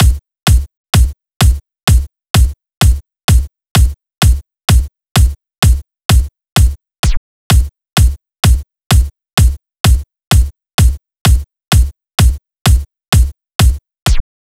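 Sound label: Loop 128BPM bass drum; VEC5 Drumloops; VEC5 Multi Loops 128BPM; Loop Elements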